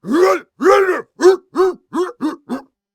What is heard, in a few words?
Gorilla Grunt; Intense moments